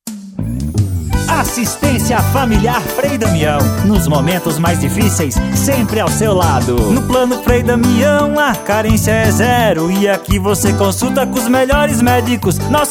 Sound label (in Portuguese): Forro, jingles comerciais; Assist. Familiar Frei Damiao